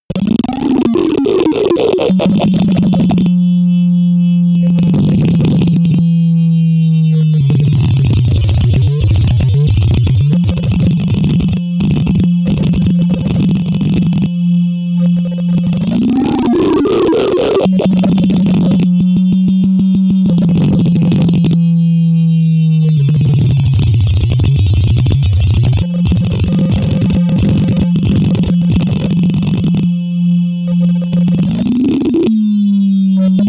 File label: atmosphere computer goes crazy